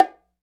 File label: New Hi Bongo, Roland BK-5; Percussion